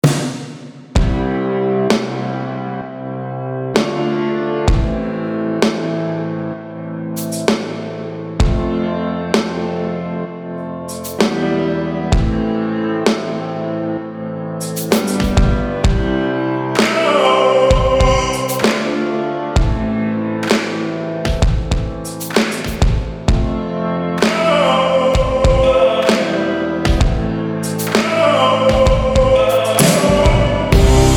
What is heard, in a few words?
Big Pop Score; Der Lehrer